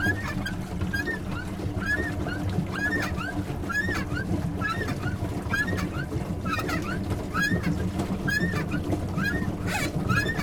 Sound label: Duck boat loop; anemoi